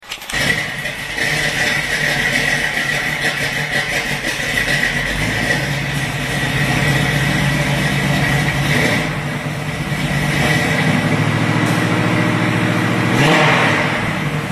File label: 69 Camaro SS 350 3' X-Pipe